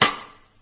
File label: smacking sound, smacking lips